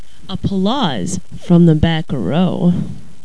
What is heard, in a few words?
applause from the back row